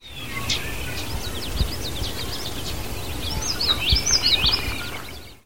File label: Yellowthroat